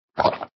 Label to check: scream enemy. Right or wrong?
Right